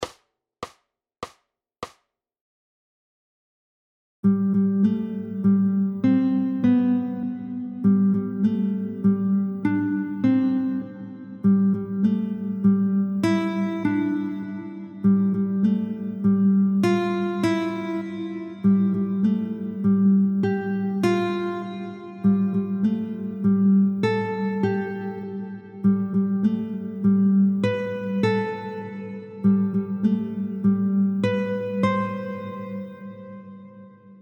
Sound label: Guitar Gym Sequencing oefening